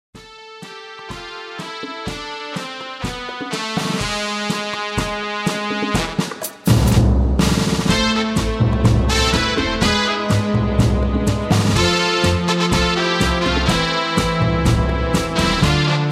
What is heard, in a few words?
Tango